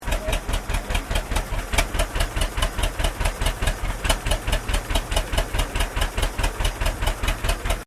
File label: Truck 1923 M2 40 Idle; Thornycroft; Classic comercial vehicles; Trucks